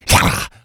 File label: rage, infected, male